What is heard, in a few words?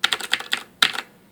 keyboard